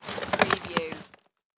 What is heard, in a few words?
Garbage Can Crush; sound-effects-bible